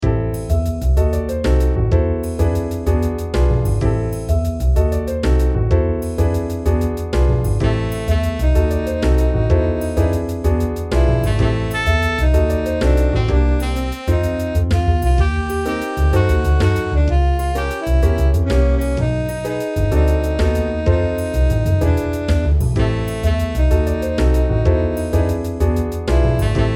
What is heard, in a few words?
jazz